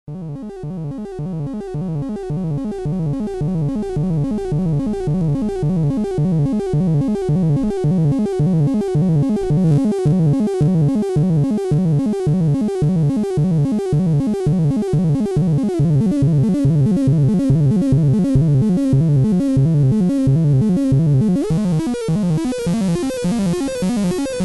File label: analog synthesizers sequencers; dr zee workshop